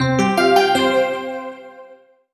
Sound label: Solo EPiano Positive; MUSIC EFFECT; startide